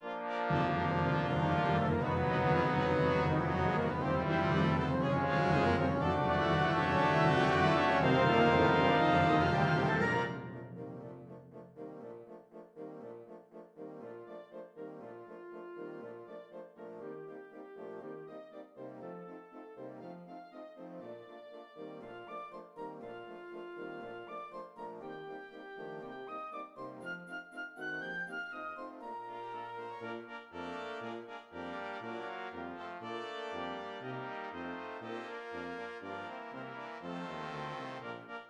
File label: Jingle Bells